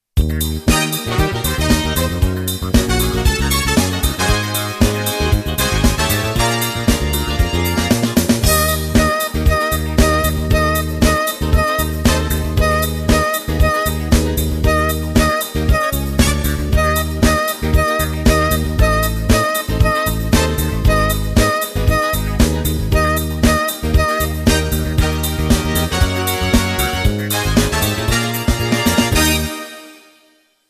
Saturnus, trombon trumpet

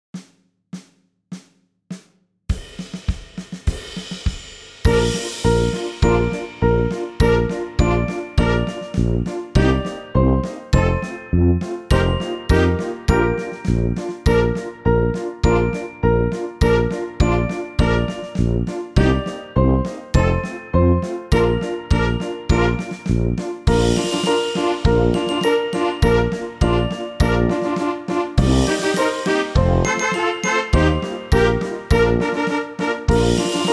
Gåsmarsch Långsam, trombon trumpet

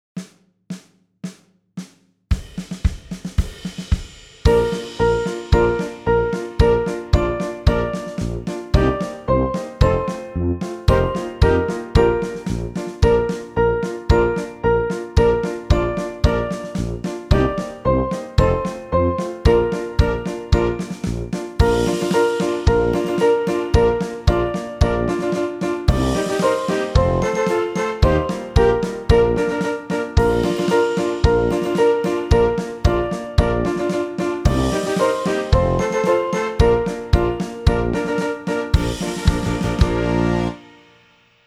Gåsmarsch, trombon trumpet

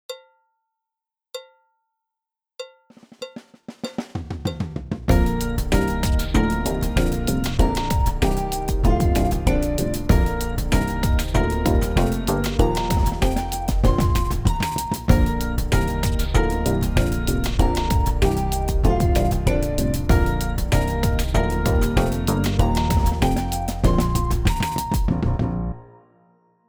Piggvar, trombon trumpet

